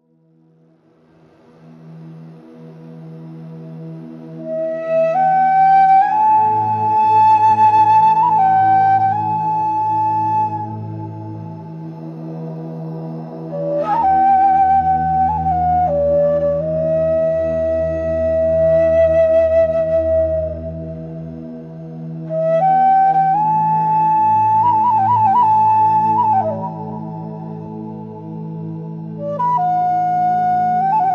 Ambient Whispers

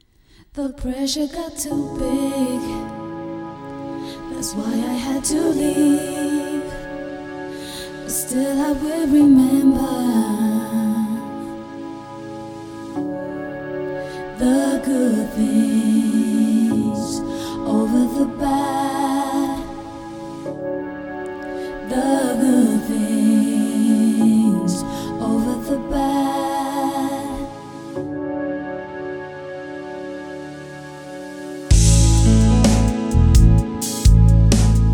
Slow Funk Vibes; Pulsating Desires

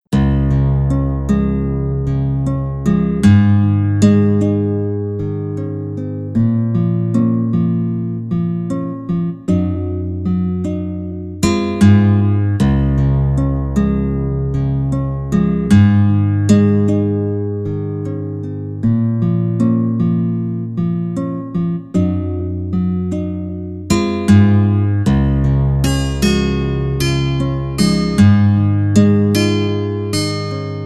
hawaiian guitar dawn on a beach 432 Hz

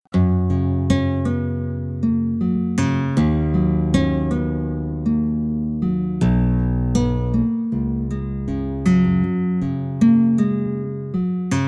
hawaiian guitar 432 hz sample

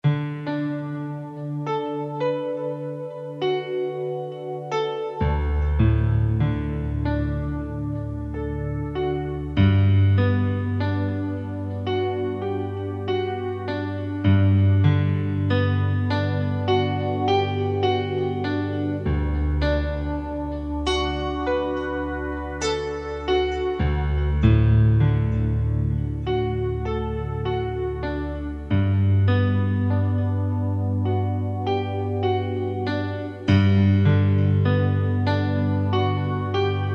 Soothing Harmony

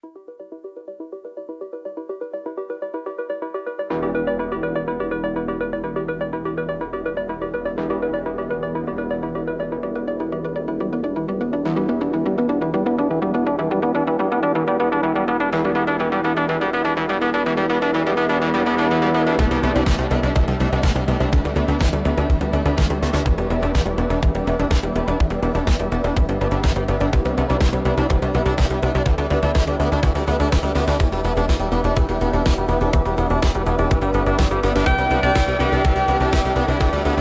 Electronic Impulse

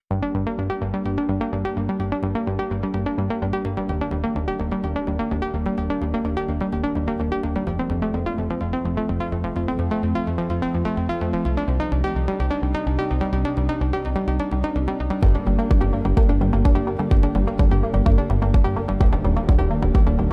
Electronic Impulse

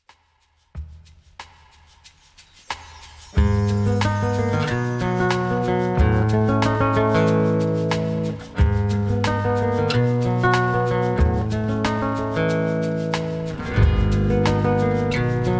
flamenco vibes 432 hz

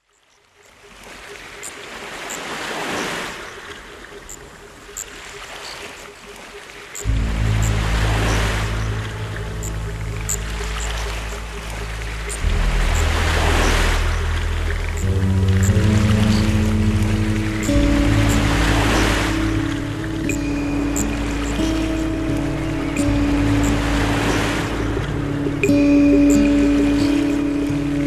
spa music 432 Hz; simply love

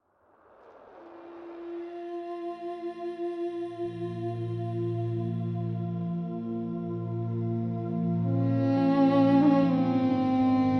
Music With Nature

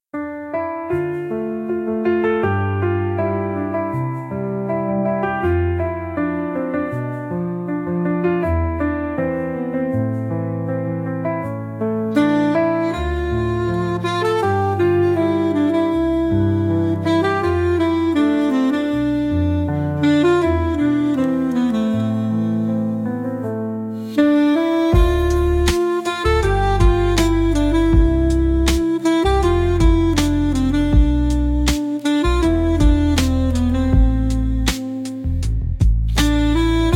saxophone dreams

